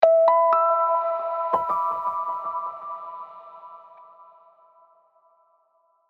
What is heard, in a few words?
jingle